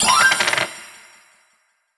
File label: coin win notification; mixkit